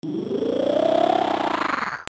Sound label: Snarl; Animal